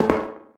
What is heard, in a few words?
pipe step; Golden Crayon Sounds